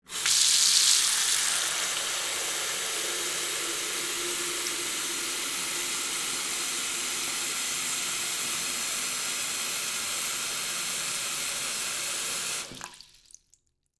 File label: faucet